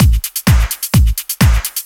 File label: Clubhouse Loop 128 BPM